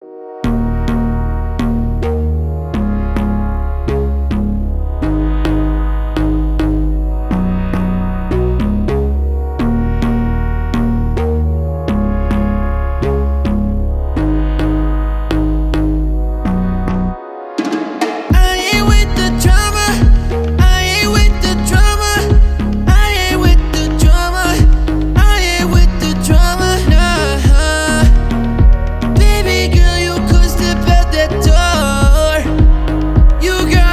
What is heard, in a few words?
Reggaeton